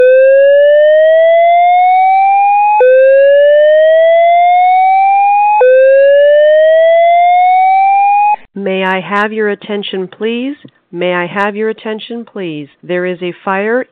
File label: firealarm